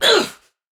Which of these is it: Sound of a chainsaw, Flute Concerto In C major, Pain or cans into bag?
Pain